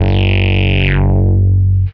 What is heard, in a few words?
swoop; Notes